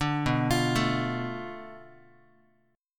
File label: B Minor 7th Flat 5th, Guitar; Bm7b5 arpeggiated; 5,7,8,7,x,x; 4A,4E,5A,5D,5G#,6C#; JGuitar